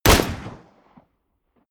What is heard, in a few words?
pistol close shot; schusswaffen